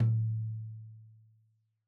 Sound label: Tom2 Stereo, Deeply Sampled Drums; SMDrum